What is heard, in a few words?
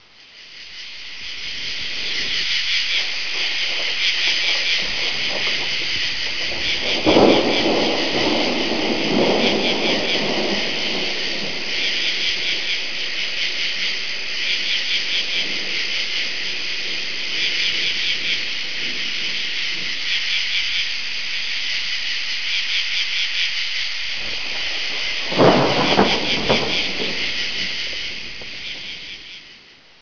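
rain for, rain forest; 8bit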